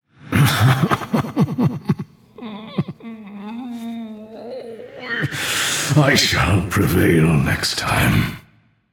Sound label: boo hoo; smite; gilgamesh